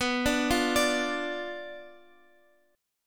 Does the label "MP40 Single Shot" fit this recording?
No